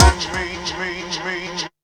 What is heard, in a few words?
Techno; e-LAB